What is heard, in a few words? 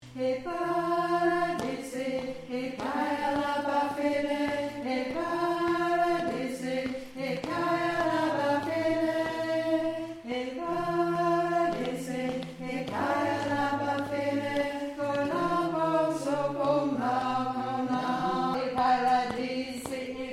Alto